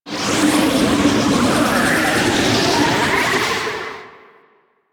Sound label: Corpus Powerplant Jettison Whoosh; Ambience; Warframe; Corpus Railjack; Tether Power Plant